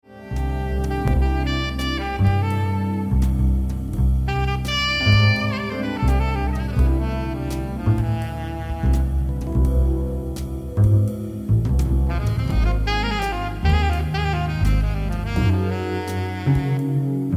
Song alto

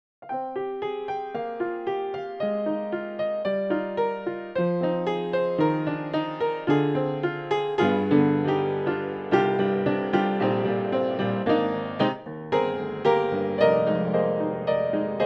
piano

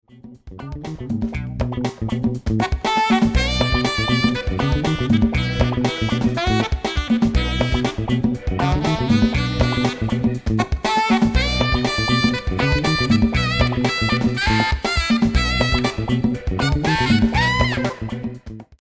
Dukoff D8 funk

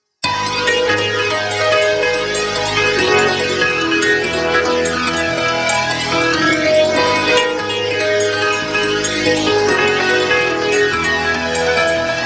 GameOver

